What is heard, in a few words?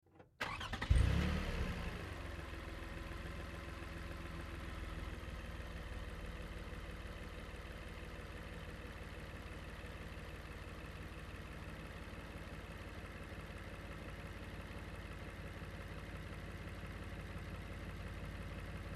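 Car Highlander Onboard Start Idle Off; 2005 Toyota Highlander V6; Cars; Vehicles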